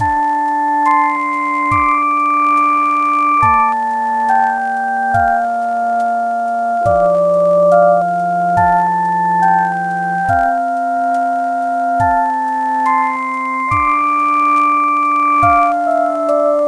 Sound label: lofi